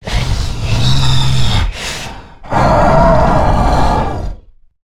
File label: Pain Heavy Breaths; Evolve; Goliath